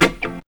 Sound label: GTR STB